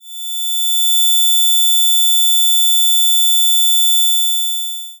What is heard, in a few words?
Vn nonvib E5 mf foldover